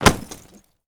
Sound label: body impact